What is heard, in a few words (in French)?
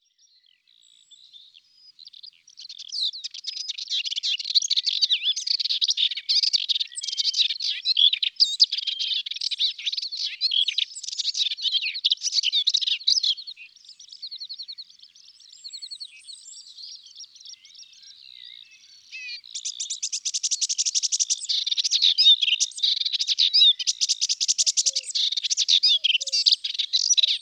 Hypolais polyglotte, Chants d'oiseaux dans la campagne